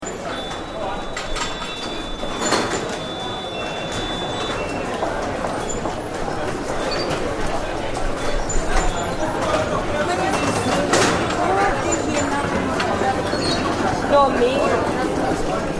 Tube Station Gate, London underground tube Station ticket gate ambience; Train Stations